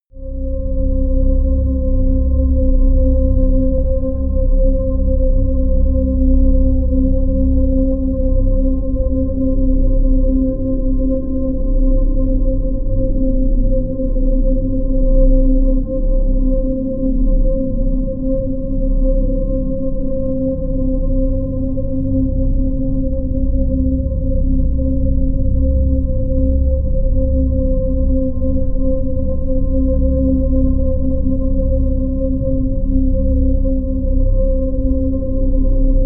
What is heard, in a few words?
drone whistling deep